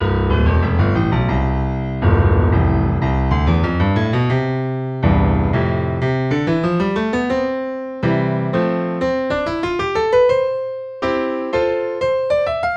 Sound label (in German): Lauf C0 C8; Grandeur